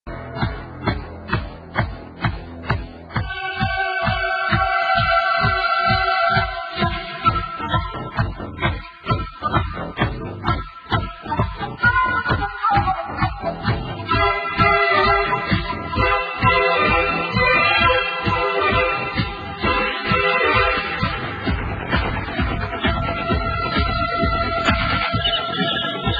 Beat

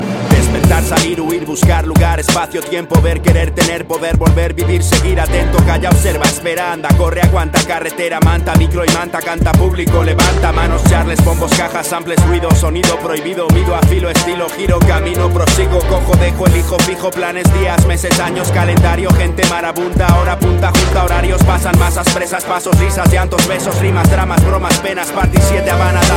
Rap

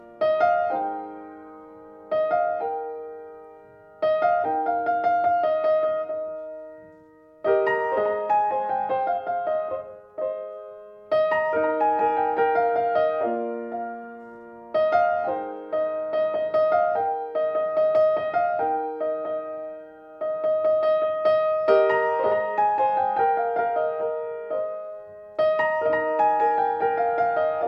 Got Your Back piano

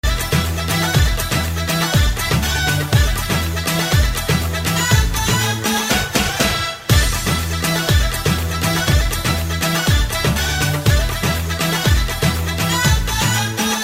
Fast Music

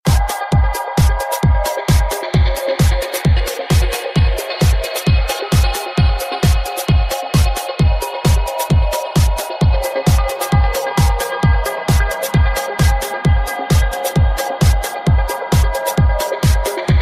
Trance Sound

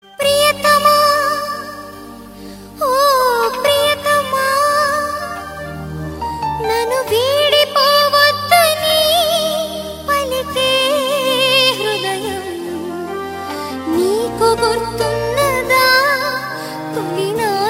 Very Sad Love

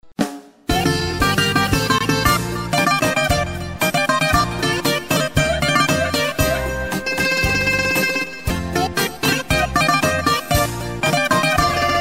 Greek Music

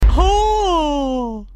Hoooh

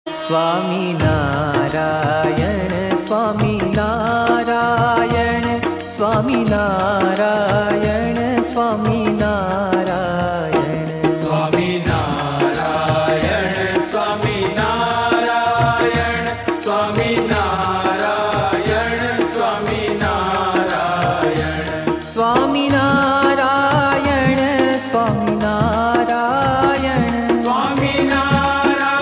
Dhun; Swaminarayan

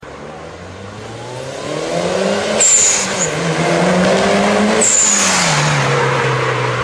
Blowoff Valve

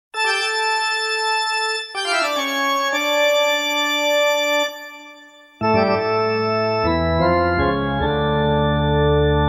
Spooky Organ

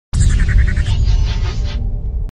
Shockwave Sms

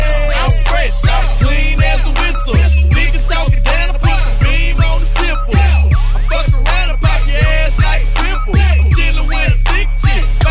Clean As Whistle

